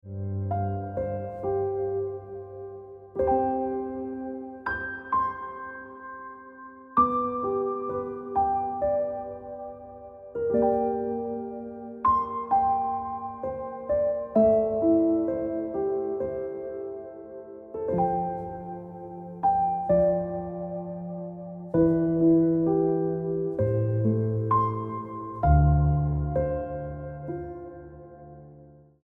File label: Ringtone Piano Music